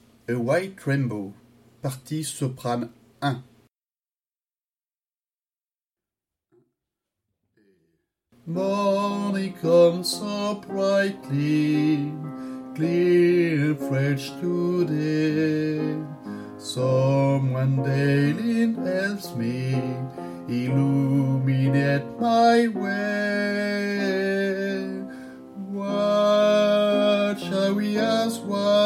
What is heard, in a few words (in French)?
Soprano et piano